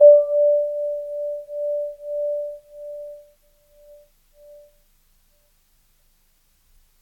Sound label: Grand Piano, Kawai, Sustains, D4; Chordophones; Legacy